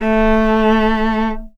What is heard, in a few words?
String